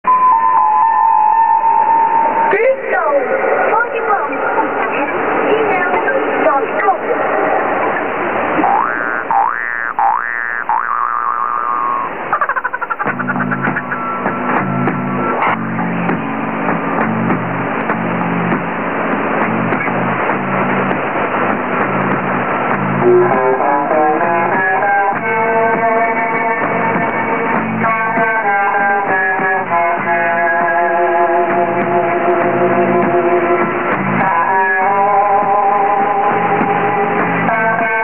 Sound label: 15090kHz LSB